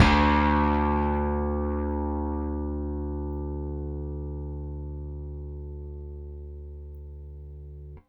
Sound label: Rickenbacker Bass; Airwindows